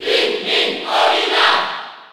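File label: Cheer French, crowd; Olimar; SSB4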